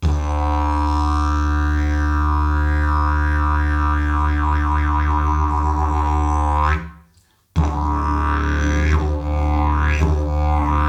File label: didgeridoo; briza naturel